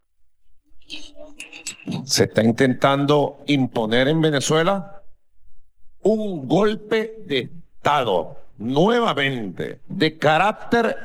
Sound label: vocals